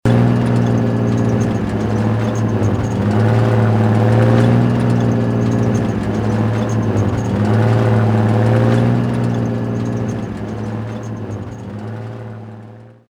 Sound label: truck run; Soldier Of Fortune II Double Helix; XBOX; ambience